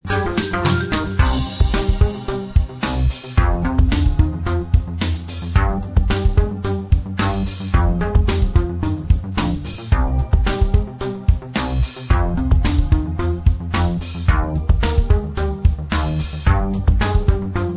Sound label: Disco Lounge; music only